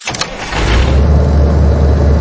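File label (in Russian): завели ключом автоцистерну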